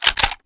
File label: release, weapons; brainbread